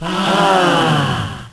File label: AHHH'S, Kids